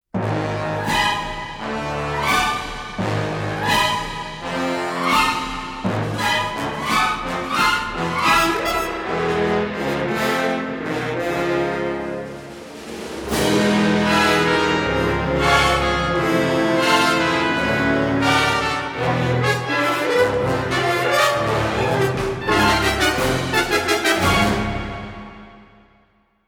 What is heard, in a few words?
Stomp